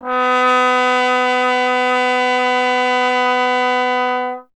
Trombone B; Miroslav Vitous - Solo Instruments VOL-1

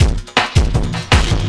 LOOP L, Drum & Bass 160 BPM

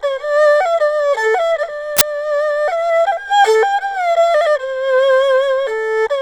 ERHU 90 C; INIS - Opium

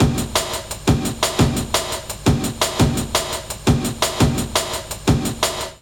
LOOP, Jungle

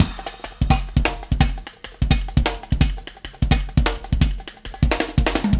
Warp Drive Beat, Processed Drumkits

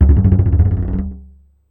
Marbles & Framedrum; musicradar; contact mic madness; Raw

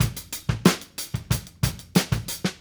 Call And Response Drums A, 70s Analog Funk; Sonic Foundry (Sony Creative Software); What it Is!

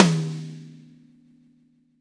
V-Pop Tom 50, Roland BK-5 Toms

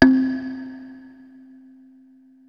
KALIMBA C3; KARAMBA

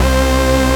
SYN K-2000, Roland

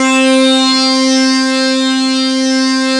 MOOG LEAD; S-LEAD; AKAI S-Series CD-ROM Sound Library VOL-8

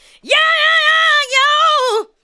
ADLIBS

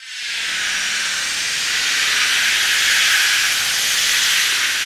SFX, 170 BPM; Ueberschall; Drum & Bass Resonance

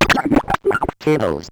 Bent Talking Teacher, Circuit Bent

